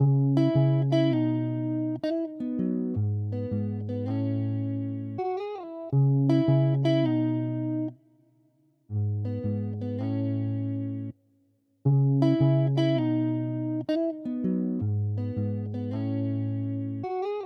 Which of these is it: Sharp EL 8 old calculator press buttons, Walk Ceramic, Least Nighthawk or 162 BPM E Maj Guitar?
162 BPM E Maj Guitar